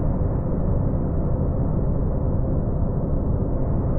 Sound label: InfiniVerb Noise, sparse soundscape Loops